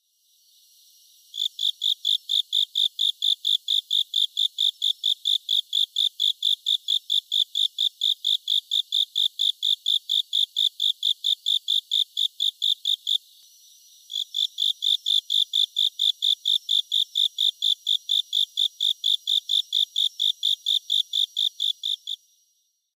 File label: Night Beetle